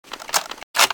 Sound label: clip in, weapons; csco; csgo